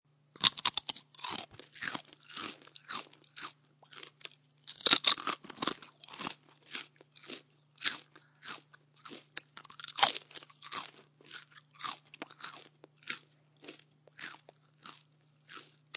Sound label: Chewing Celery, Crunching on celery; Eating; Human